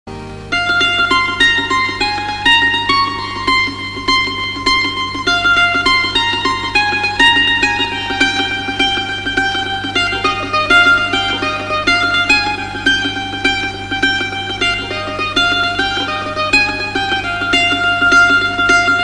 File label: iconic guitar ringtone, bollywood; dilwale dulhaniya le jayenge